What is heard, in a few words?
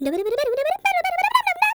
SCAT